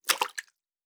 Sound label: Water Splash; Survival Game Sounds - Lite Edition; Environment